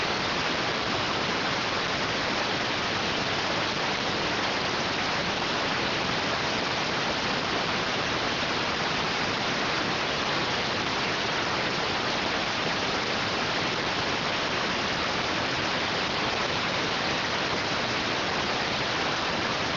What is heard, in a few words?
rtty rx